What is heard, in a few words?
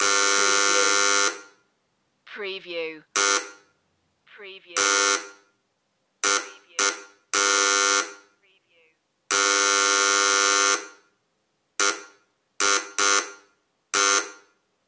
door bell or buzzer